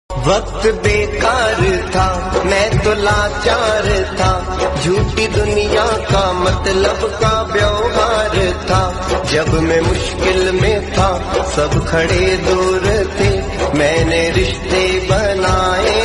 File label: Khatu Shyam Bhajan Hindi